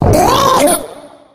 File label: female boomer warning; voice; Left 4 Dead 2